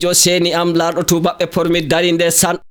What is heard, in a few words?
VOCAL LINES; Best Service; World Colours